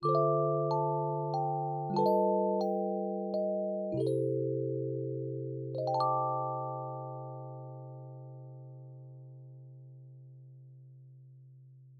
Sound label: Dog Sleep Theme